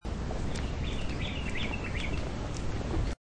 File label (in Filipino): ibon